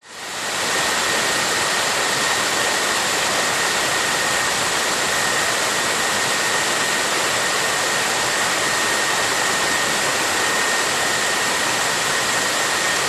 rainforest evening waterfall creek distance cicada insects; nature